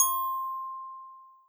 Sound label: C6 bell